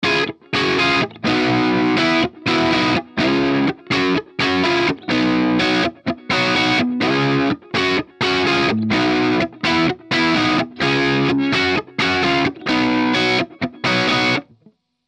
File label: CRUNCH